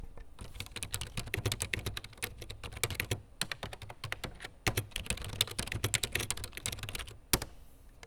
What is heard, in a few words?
TYPING OF KEYBOARD